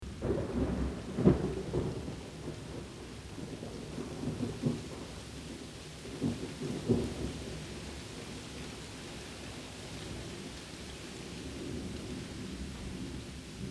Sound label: Rain